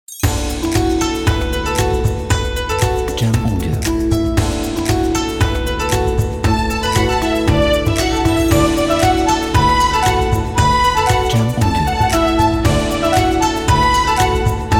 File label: Chinese New Year Happy Intro